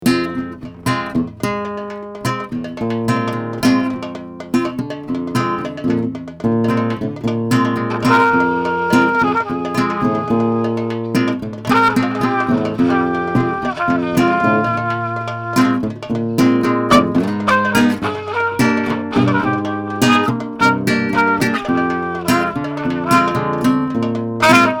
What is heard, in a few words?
guitar trumpet